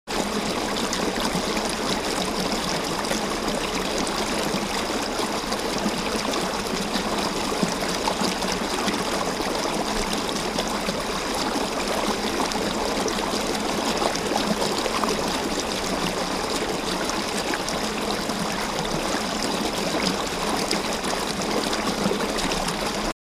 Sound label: Wave Tunnel Interio, churning water